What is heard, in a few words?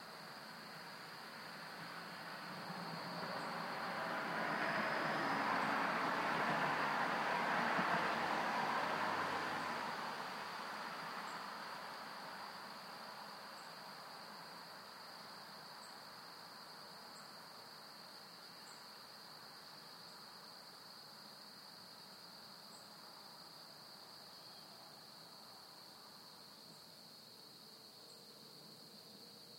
porch tea with traffic peepers